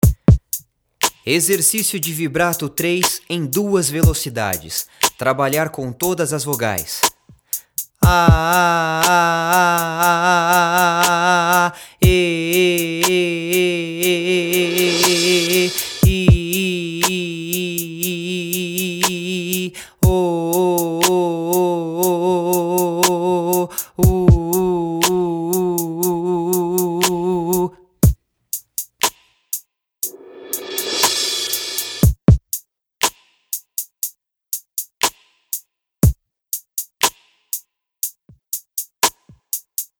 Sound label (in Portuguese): Vibrato Exercício